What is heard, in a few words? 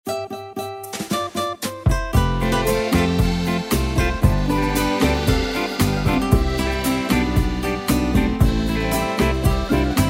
60s Beguine Italy